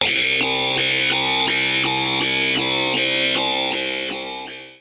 Industrial Metal

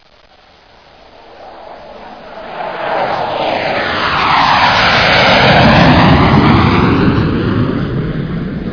F-16 Fighting Falcon

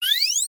Maximize Flash Sound

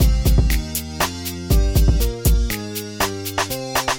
Hip Hop Hybrid

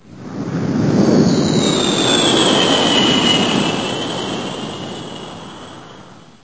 Jet Flyby Sound

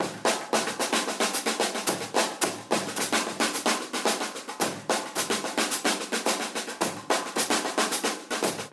Marching Band

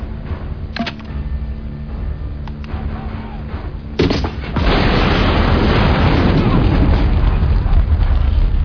Grenade

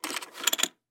Poker Chips Sound

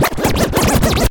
Dj Sound Effects

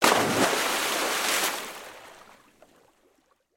Jumping Into Pool

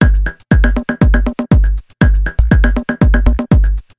Hard House

